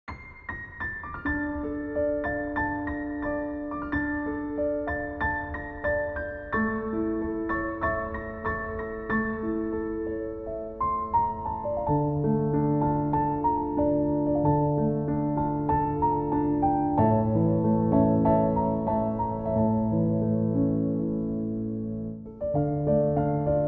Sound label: Piano Version